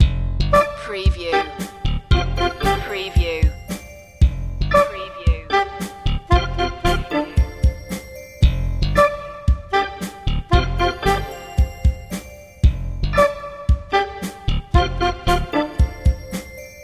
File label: new funk loop